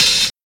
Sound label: low treble open hi hat a sharp key